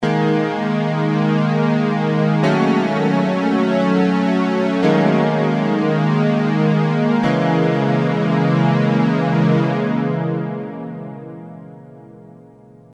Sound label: m1000 dimension c